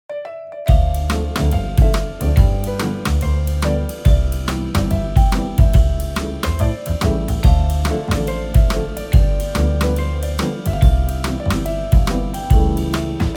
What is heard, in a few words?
Easy Elevator